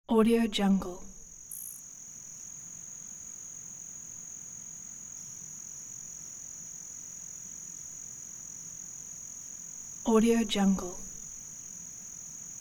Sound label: crickets in the field long